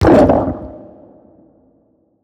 organs; Thalamus; CARRIER

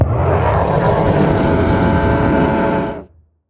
death; Barotrauma; Charybdis

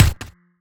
chain Gun Shot; Weapons

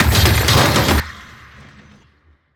Explosion Debris; Weapons

